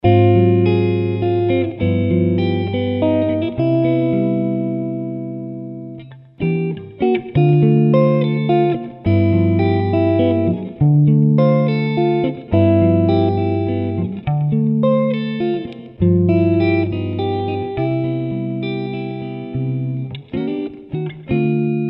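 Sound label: The Lone Guitar, medium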